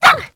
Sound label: Puppy Idle Bark; Vocals; Warframe; Kubrow